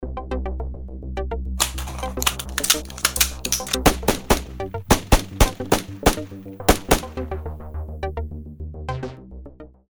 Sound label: Ambisonic Assault Rifle Sig Sauer SIG716 308